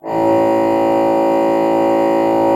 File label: A1, Cathedral Organ, HOLZPRINZIP; Propeller Island